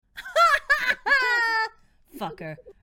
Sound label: ha ha ha